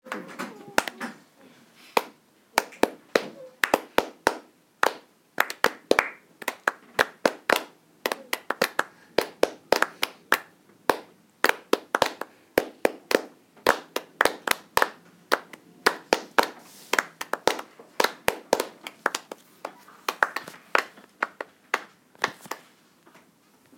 clapping piece

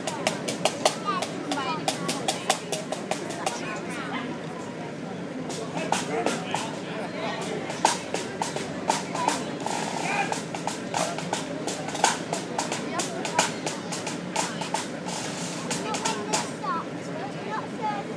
scottish drum band

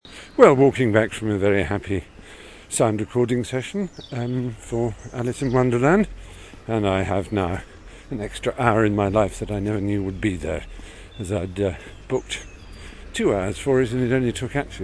spring walk

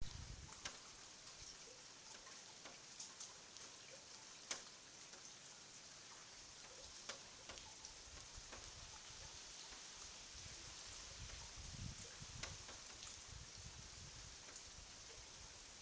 rain

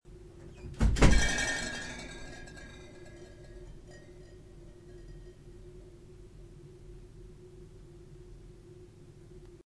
bottle noise

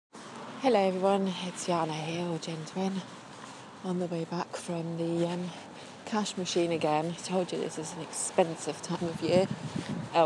trains and music

